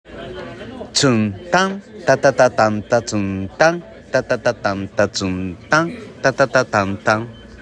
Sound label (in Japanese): カラオケ音源